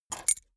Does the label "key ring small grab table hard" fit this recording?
Yes